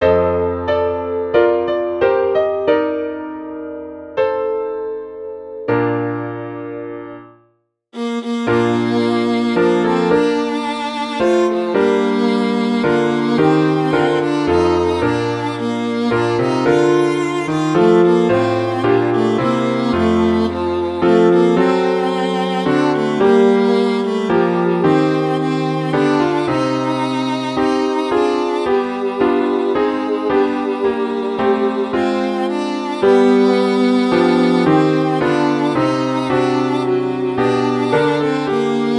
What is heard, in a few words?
for viola piano; purcell henry; come ye sons of art away